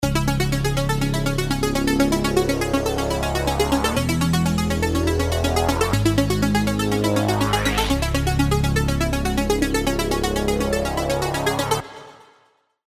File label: Uranus, serum arps; revealed serum arps vol 1